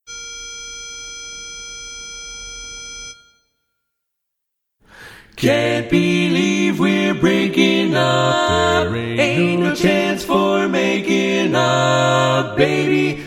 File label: Baritone missing; Polecat II